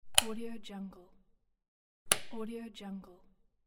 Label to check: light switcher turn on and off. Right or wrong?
Right